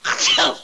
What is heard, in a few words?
fill